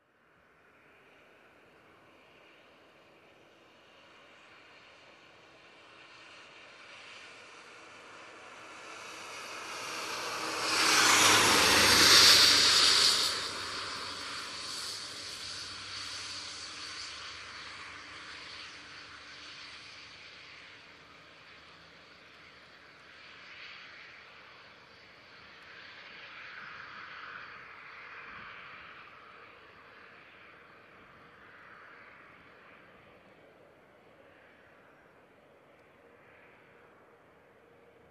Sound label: Car Driving by on wet road